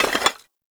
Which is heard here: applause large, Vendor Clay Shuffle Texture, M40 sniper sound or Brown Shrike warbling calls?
Vendor Clay Shuffle Texture